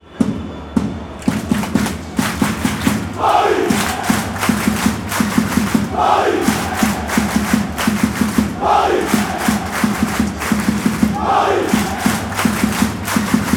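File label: clap clap paris, football songs and soccer chants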